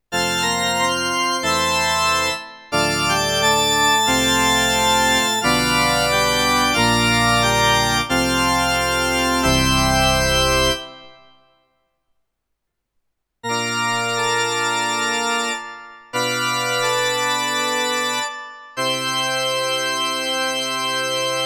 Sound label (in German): Roland Go Piano 88 Orgel